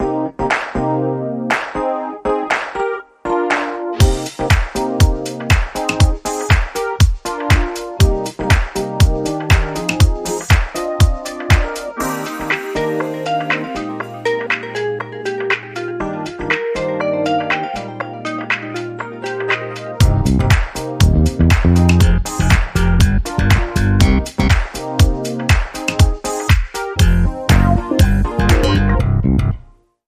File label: Harlem Disco Funk, Rhythm